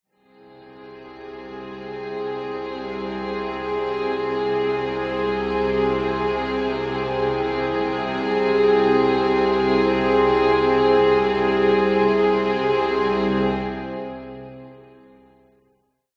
horror suspense strings; zapsplat

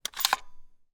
weapon gun ar 15; still north media

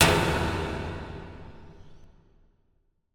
impact metal hit large reverb industrial

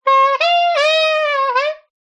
Sax Riff Funny

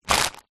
foley plastic packet light pick up from surface; zapsplat

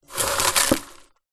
popcorn handful take from metal bowl

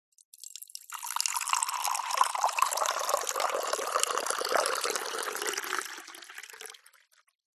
hot water pour mug from kettle coffee tea; food drink; zapsplat

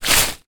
foley dried leaves movement foot scrape; zapsplat

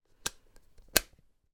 toy module clip in; mr potato head

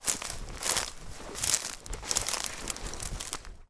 footsteps dried weeds plants

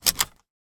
van seatbelt unlock iveco

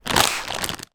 foley packet plastic blow air into; zapsplat

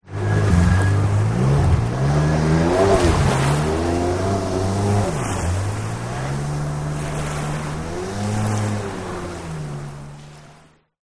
Jetski Tkng Off

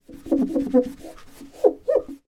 household run squeak clean window double glazed

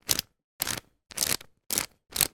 foley lock combination dial turn

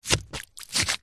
wood stick poke into sludge wet mud; foley

